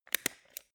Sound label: stapler